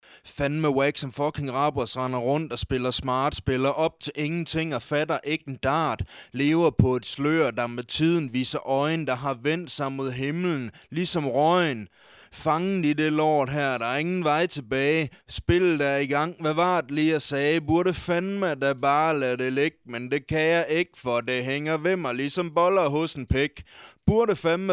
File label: rap 80bpm